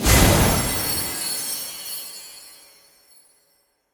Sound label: mega Win Text Change; fairyred